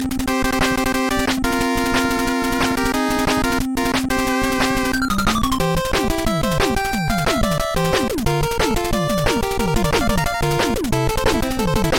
8bit; Megaman 2

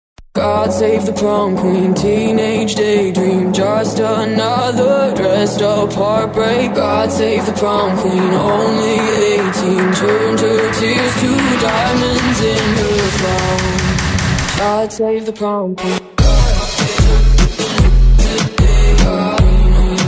remix

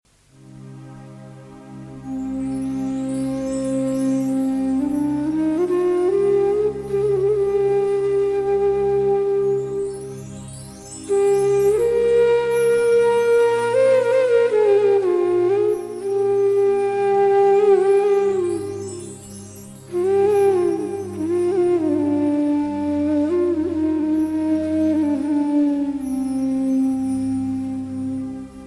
Slow Melody

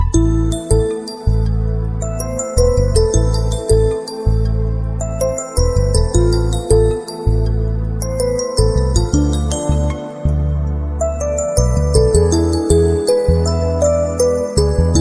Sweetest Beats

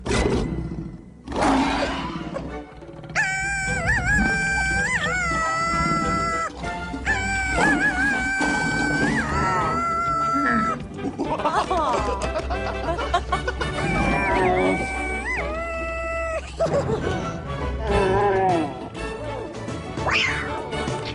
Monkey scream

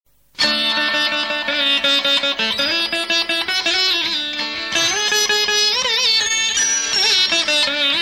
Classical

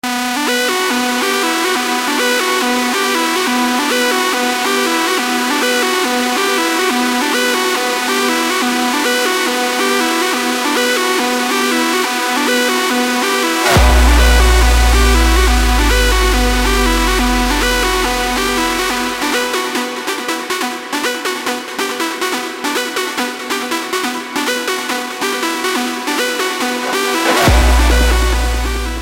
Here Come The Drums

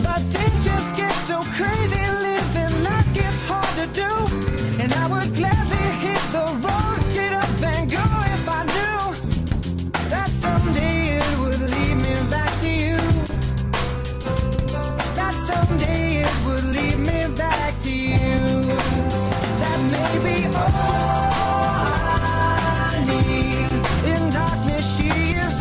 Sunday morning; M5

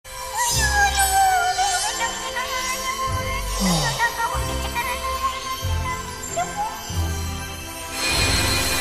squeaks; chirithy

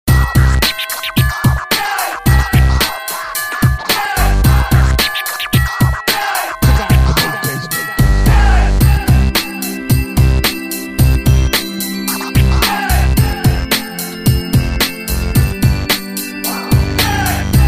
Techno Jingle Bells

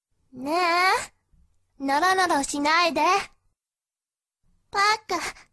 Tsun ringtone; jpn

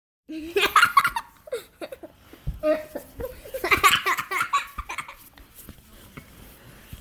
laughing

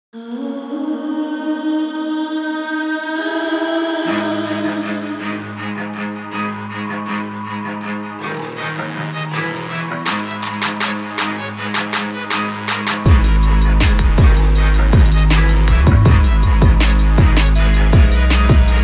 Best Bass

